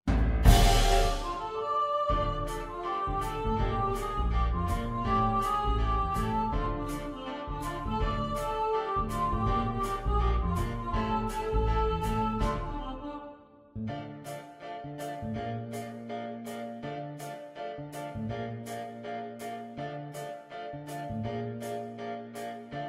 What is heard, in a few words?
instrumental with piano